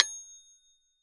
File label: bell, metronome